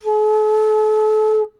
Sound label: Flute A